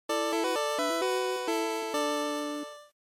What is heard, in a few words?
GB General Clear